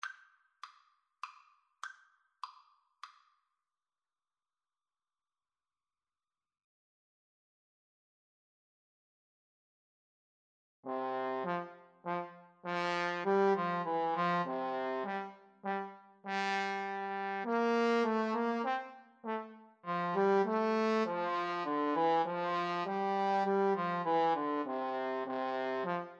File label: Trombone Duet